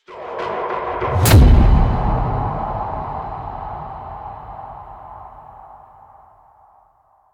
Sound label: Thump, Forest Night; Stop Umbra; Warframe; Sacrifice